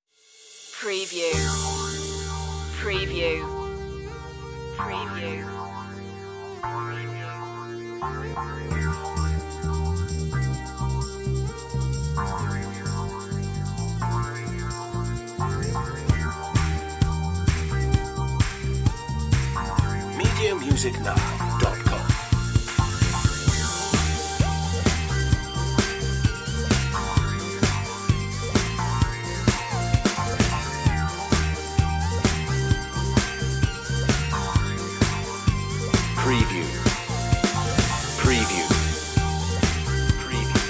boogie disco

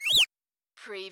CARTOON SQUEAK

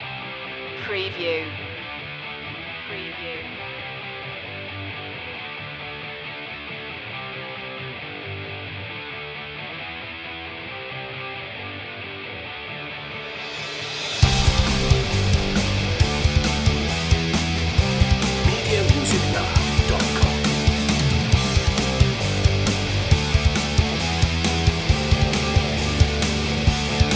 underscore

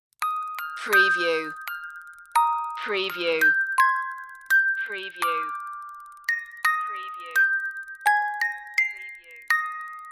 musicbox; it came upon a midnight clear

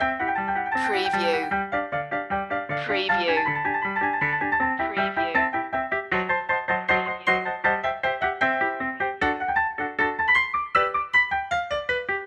silent movie chase loop

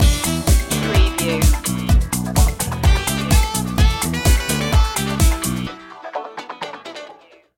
funky juice stinger

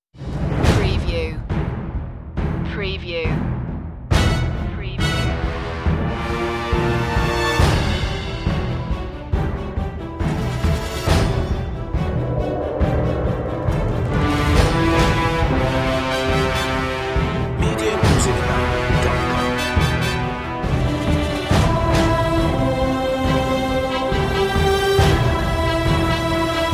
wolf vs vampire

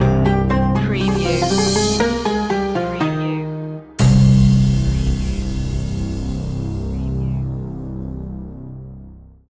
eastern mystic stinger

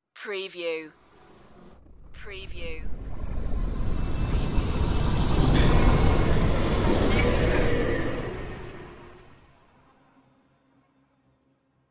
evil dark rising

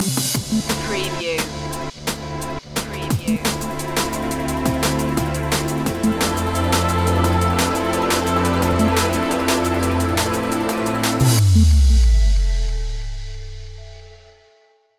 drum n space stinger

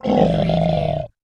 Monster Grunt

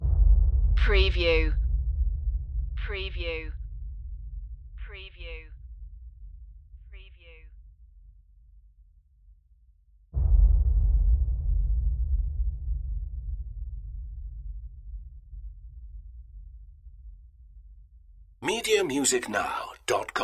perc loop

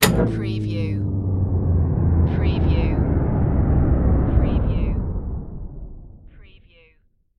System Power Down

